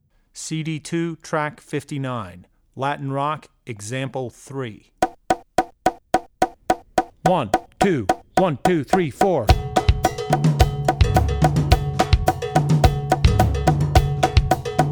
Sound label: Latin Rock; Latin Rock (Example 3); Drummer's Bible Tracks